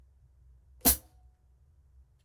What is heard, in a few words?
16in Light HiHats, cymbal